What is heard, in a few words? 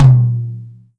Tom Low